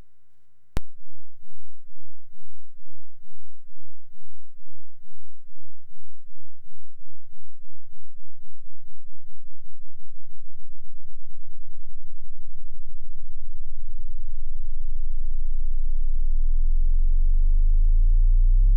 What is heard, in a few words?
DVCO Sweep Sine; macbeth; xseries